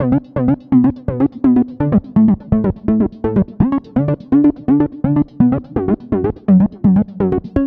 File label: Tri-Wobble, Bass; Sonic Foundry (Sony Creative Software); Underground UK House & Electro